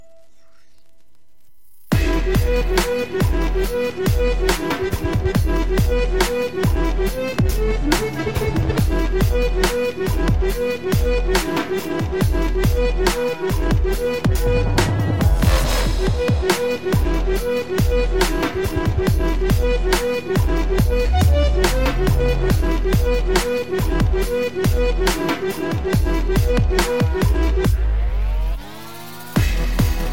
taiko

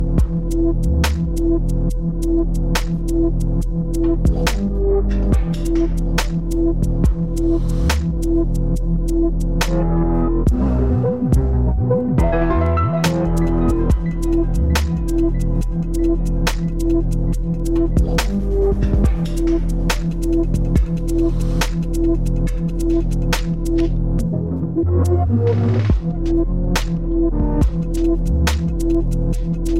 taiko close

